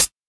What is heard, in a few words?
crunchy hi hat f key